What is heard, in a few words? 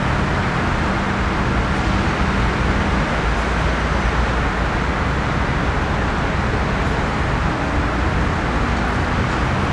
amb CAR; Scenery; Decors